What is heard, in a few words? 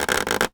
Polystyrene Creak